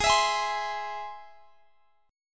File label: Ab Augmented, Guitar, Drop D; x,x,18,21,21,20